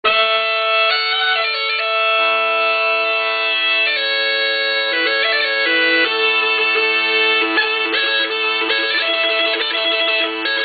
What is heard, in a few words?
bagpipes nitra